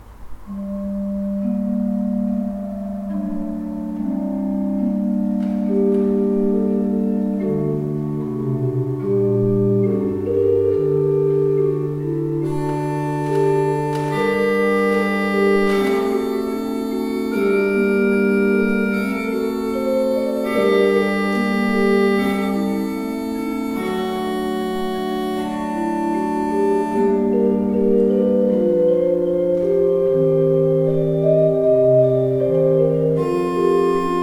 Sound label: pipe organs; Paul Ott 11 II P